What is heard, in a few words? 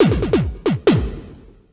percussion loops, Techno; Techno ID